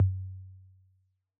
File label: Analog Tom; Yamaha DJX-2B